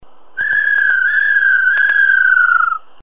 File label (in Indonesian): terapi poksay macet